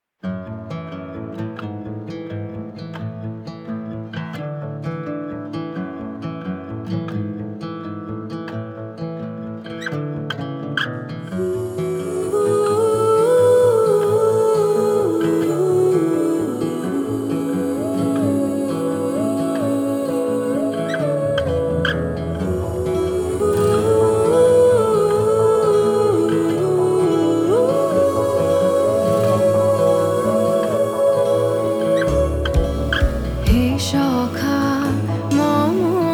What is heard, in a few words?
cinematic